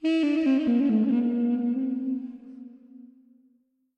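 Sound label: sounds of a duduk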